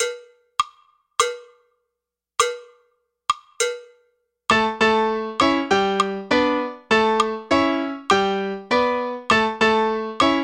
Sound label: tumbao slow